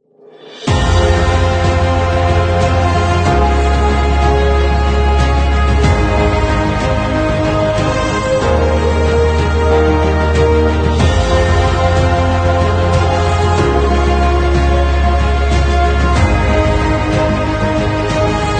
Cinematic Dynamic Epic Short Version

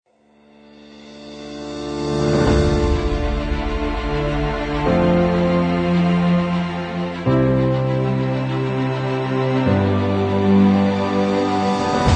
Epic Cinematic Uplifting Inspiring

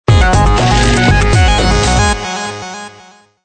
Electro Streak Logo with SFX

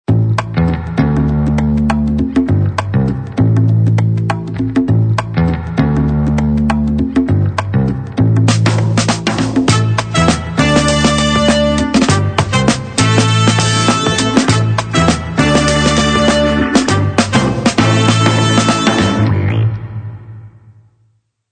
Vintage Funk Beat; 复古放克节奏

